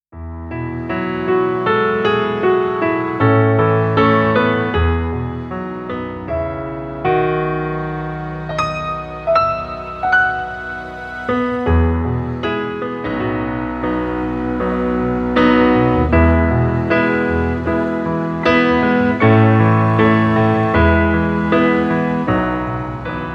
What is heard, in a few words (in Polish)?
Nie zastąpi ciebie fortepian